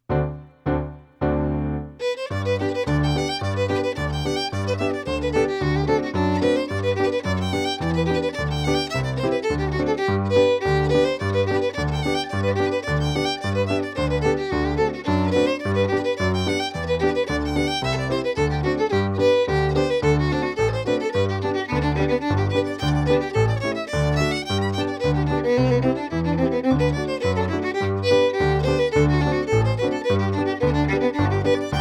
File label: Key West Hornpipe